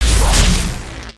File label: dummy spawn